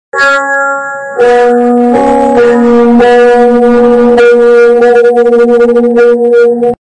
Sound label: horror music low quality; roblox